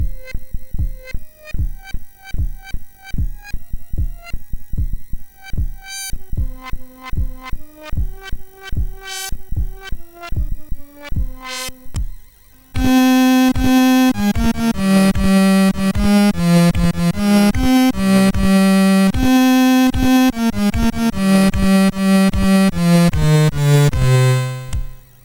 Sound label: Wellerman Loop; MOS6581R4